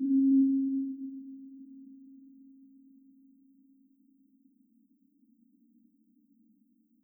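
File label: bell hum norm; cccbr; glossop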